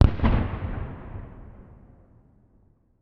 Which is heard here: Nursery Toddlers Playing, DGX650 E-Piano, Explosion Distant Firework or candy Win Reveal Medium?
Explosion Distant Firework